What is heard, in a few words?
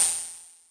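Yamaha RS7000 hi-hat cymbal G7; drum machine; Yamaha RS7000 Cymbals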